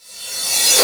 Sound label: long cymbal